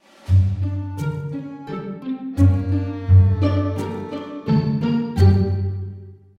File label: sting life sucks; The Sims 3; Windows